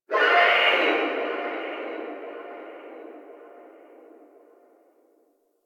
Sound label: Stadium Infested Far